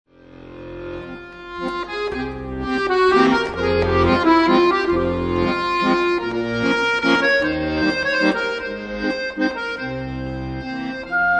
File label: wedding waltz